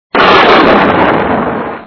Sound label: thunder; Dad 'n Me